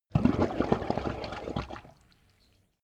volcano oneshot lava bubble

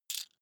coin big drop soft

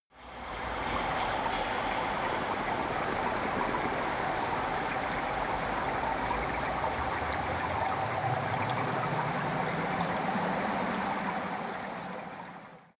water fx reso

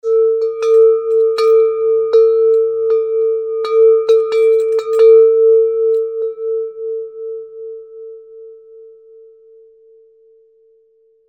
player bells

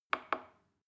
plastic button small click soft slow

